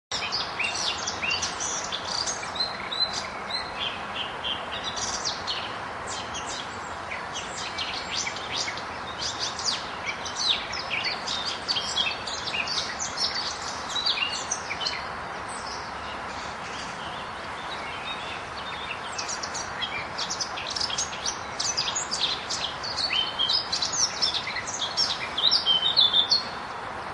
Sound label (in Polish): kapturka; zakole wawerskie